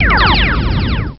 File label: Phaser fire